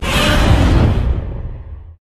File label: preattack; walking godzilla dogday